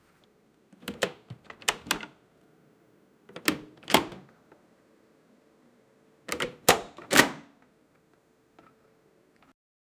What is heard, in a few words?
portal opening and stabil; stabilizing